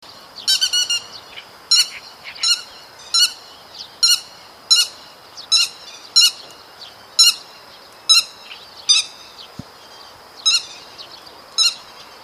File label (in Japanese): keri, birds